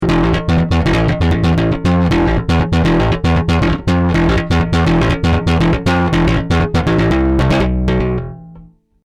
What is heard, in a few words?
Microbass, effector bass